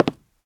wood step; Golden Crayon Sounds

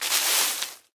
leaves fall; Golden Crayon Sounds

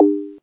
camera hazard; Golden Crayon Sounds

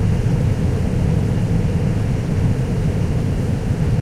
aircraft engine int; Original TK Sounds

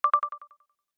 Haptic, iOS Sounds; Warsaw

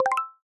PIN Submit, iOS Sounds

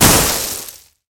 rocks hard land; Original TK Sounds

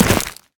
ice land; Golden Crayon Sounds

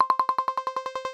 level urgent; iOS Sounds

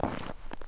snow step; Golden Crayon Sounds

